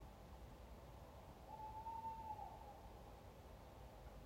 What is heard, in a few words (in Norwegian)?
ugle